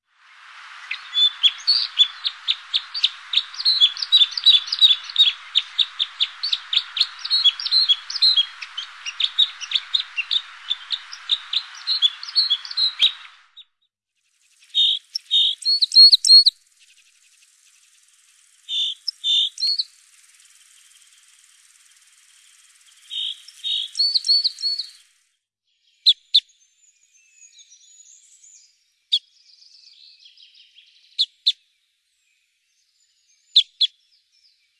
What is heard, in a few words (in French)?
Beccroise des sapins; birds